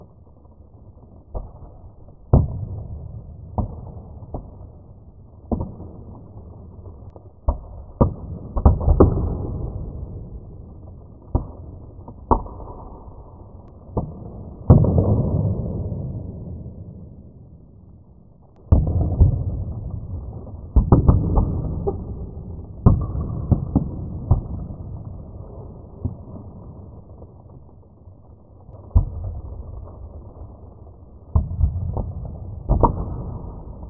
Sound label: bubble wrap low office